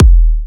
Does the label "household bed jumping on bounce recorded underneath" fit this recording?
No